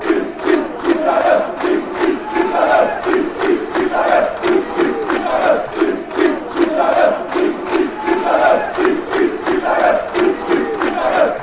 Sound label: sheep sheep sheep, fanchants; ncafc